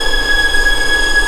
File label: ANGELIC G#5